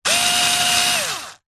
industrial power tool electric screwdriver single short burst